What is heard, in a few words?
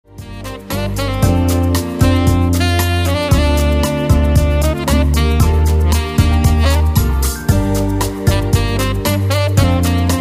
smooth saxophone chill